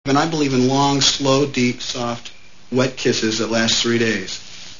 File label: kiss; movie